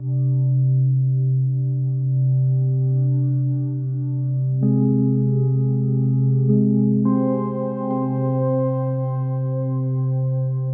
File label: background music subdued